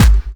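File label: snare drum bass drum, Dance Mega Drums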